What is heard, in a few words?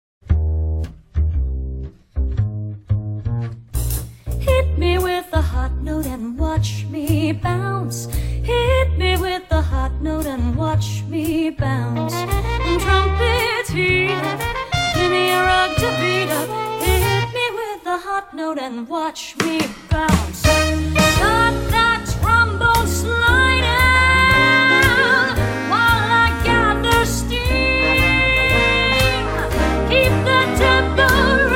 JAZZ